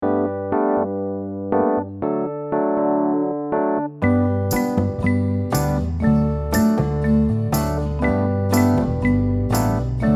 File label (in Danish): minus vokal; 1-2-3 Proev og se